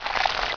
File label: CEIL DRIP